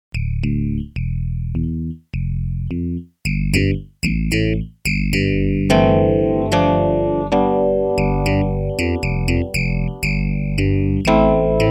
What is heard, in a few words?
FM DX7 Clavi Zing; joué doucement c'est un piano électrique usé, joué fort il s'approche du clavinet. Très expressif; Démo audio des fiches de sons FM détaillées dans le livre